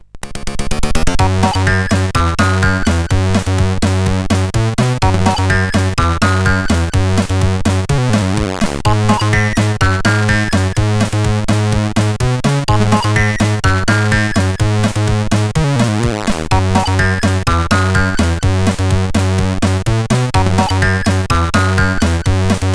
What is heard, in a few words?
Techno, CSG8580R5